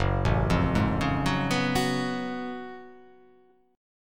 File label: Gb Minor Major 11th Gb down; GbmM11 arpeggiated; JGuitar